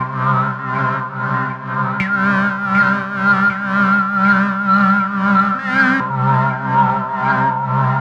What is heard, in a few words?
Esmeralda, пэд сэмпл в стиле deep house pad sample